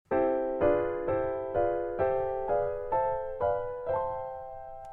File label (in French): Gamme Bebop